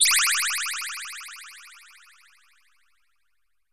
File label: space